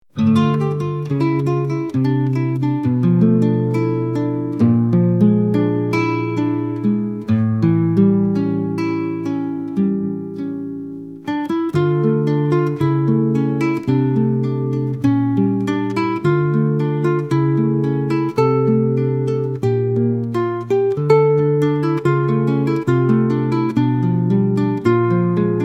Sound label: Guitar ambient; MUSIC